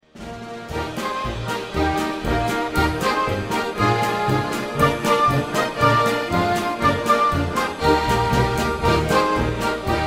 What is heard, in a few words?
party polka